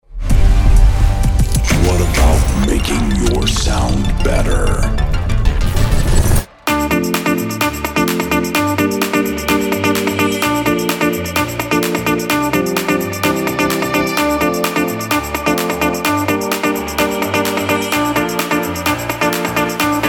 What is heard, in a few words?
EDM; InstaChord